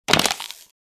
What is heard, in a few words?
Plastic bottle crush